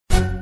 wild stop fast; Criss Cross 81; wazdan

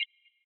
gambling mouseover 777; BARs 7s; wazdan

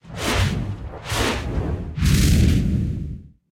win fires; Burning Reels; wazdan

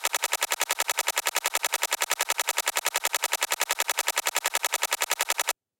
big win counter; Burning Reels; wazdan

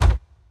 reels stop; Burning Reels; wazdan; ultrafast

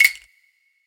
mouse; DragonsLucky8WD; wazdan

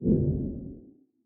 minitables open; DraculasCastleWD; wazdan

